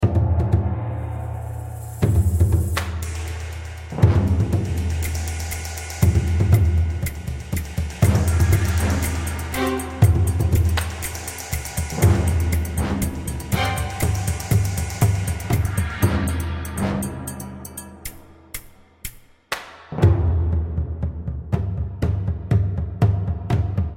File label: combatmusic; Might and Mayhem